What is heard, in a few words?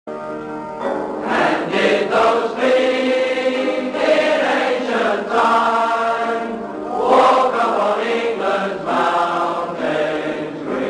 Choir, Jerusalem